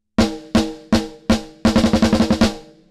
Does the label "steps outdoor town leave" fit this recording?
No